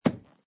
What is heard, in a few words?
pickup